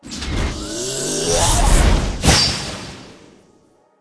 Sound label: death knight attack